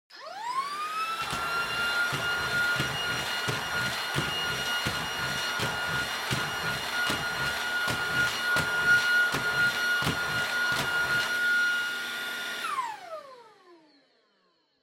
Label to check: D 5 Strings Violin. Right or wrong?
Wrong